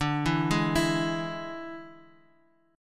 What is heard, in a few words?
D Suspended 2nd Flat 5th D